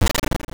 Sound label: break barrel